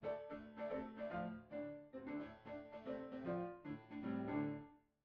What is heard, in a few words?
Piano